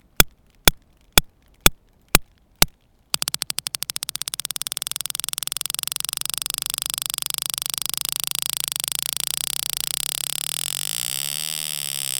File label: foraging buzz